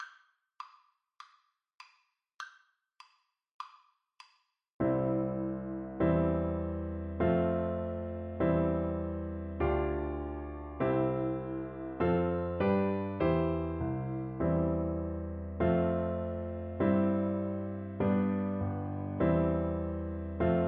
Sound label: twinkle twinkle, Trombone